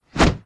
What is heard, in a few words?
zombi swing, zombieclaw